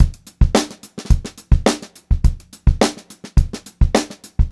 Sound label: BEAT, Funk Groove Drumloops; USB Soundscan vol.38; AKAI